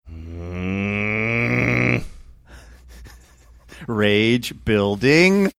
growling saying rage building

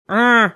errr

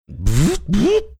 making tires slipping on ice sounds

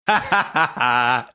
hahahahaaa

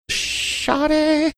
singing shorty

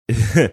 cute chuckle

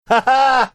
haha

